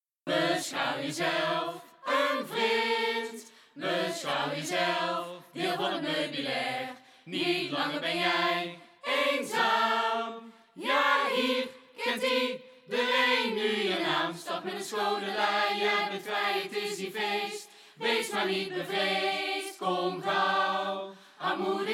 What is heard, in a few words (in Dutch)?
Alleen choor acapella